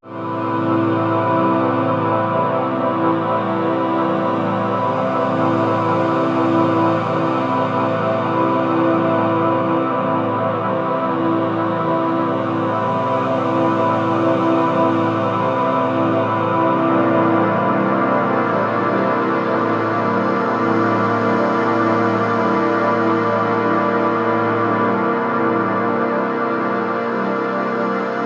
voices beyon; porphyra hybrid by ocean swift synthesis

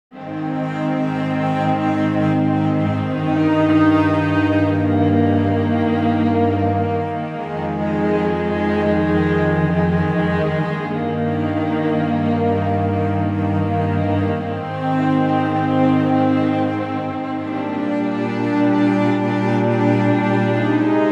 strings, subtle move; bittersweet preset; whisper strings; bunker samples

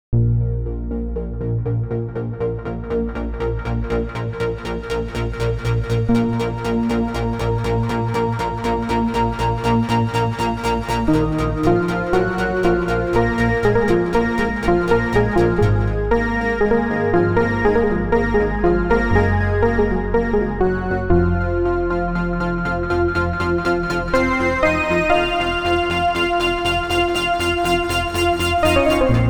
basic station sequences; the digital music

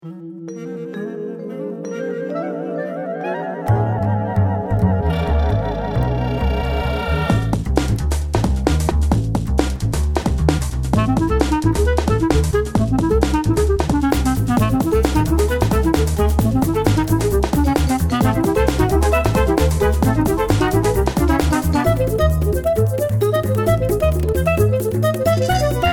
clarinet extended techniques; treble theft; complete extended techniques collection; elastic sounds

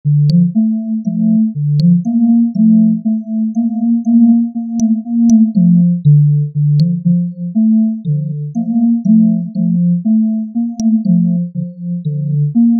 synth bunka

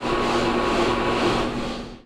large blendering blending dark; atmospheric; ambience and background fx; nova sound

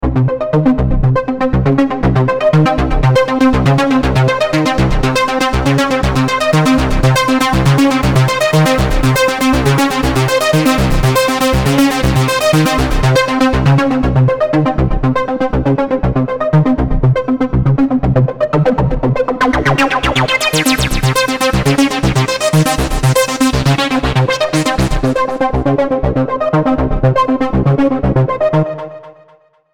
trance pattern; audioblast

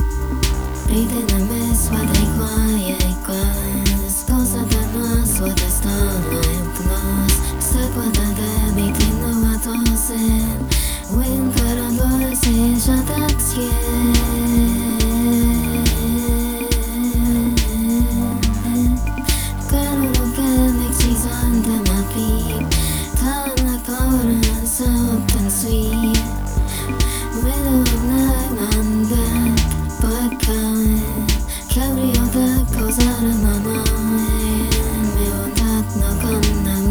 haunt; linglin art

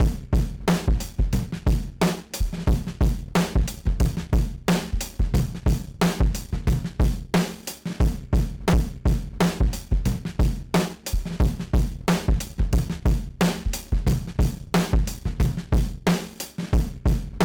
full slush

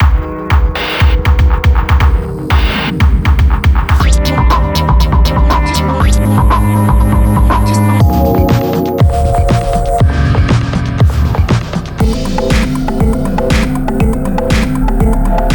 electronica; klip by sample magic

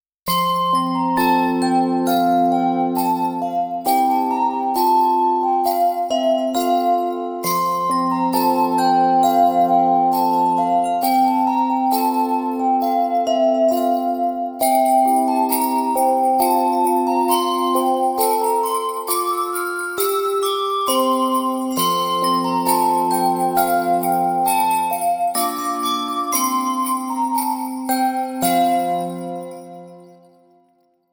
deck the halls bells only, festive bells; blueprint festive bells by fracture sounds

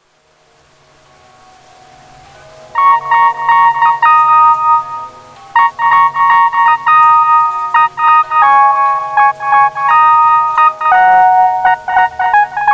simple trumpet brigade; by super synths

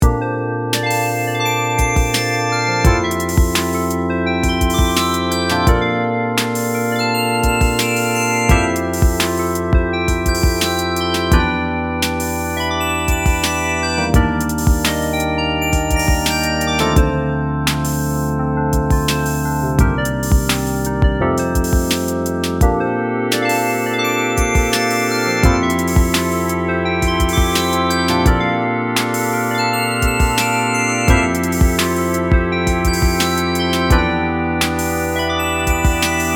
piano tone

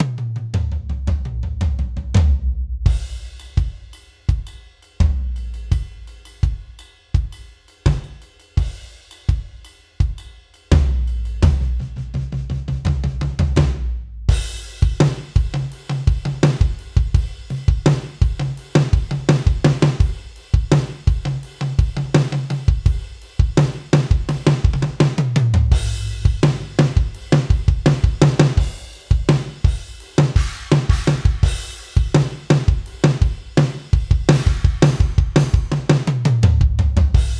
gospel drum only

